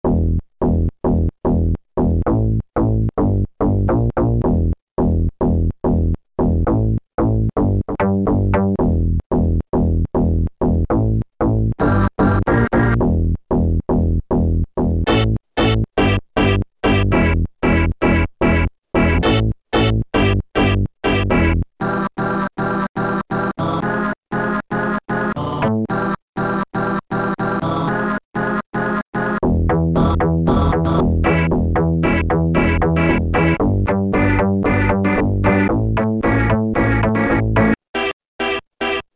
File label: early house; sampler; Guess the sampler game